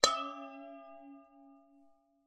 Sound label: impact metal bowl water inside